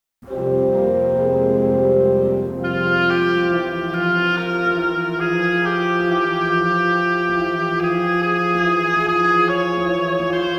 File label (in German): Variationen - Oboe; Variationen über Der Mond ist aufgegangen